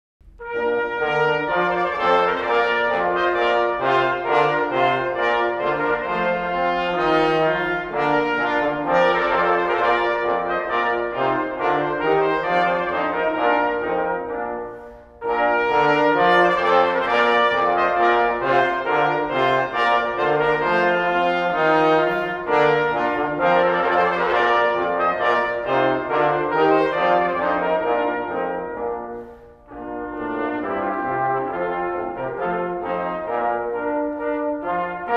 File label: Trumpet Voluntary; blechblaeserquartett; Clarke Prince Of Denmarks March